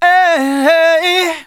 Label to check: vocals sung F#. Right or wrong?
Right